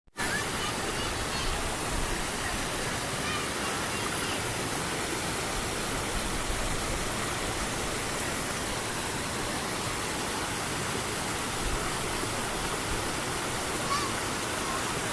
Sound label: abel tasman coast track cleopatras pool